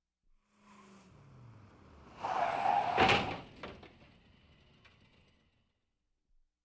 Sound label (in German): auffahrunfall mit reifenquietschen, crash; Auto-Zweiraeder